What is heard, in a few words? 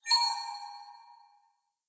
Node On; blue archive